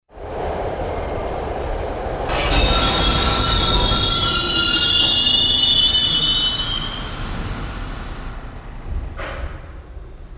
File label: Subway Train Comes To A Stop